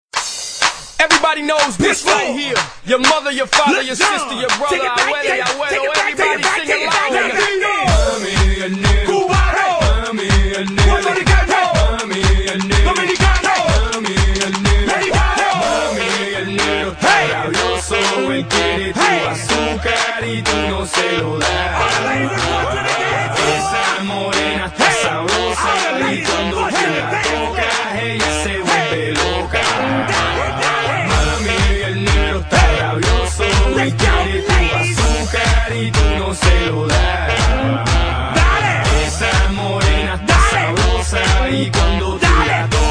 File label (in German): musik techno